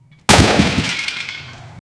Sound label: guns